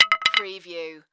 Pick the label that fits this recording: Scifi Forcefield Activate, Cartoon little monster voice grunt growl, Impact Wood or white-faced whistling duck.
Impact Wood